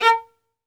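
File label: SOLO VIOLIN, Synclavier Strings; ILIO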